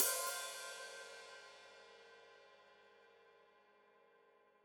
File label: Hat Foot Open, Hi-Hat; Deeply Sampled Drums; Cymbals Stereo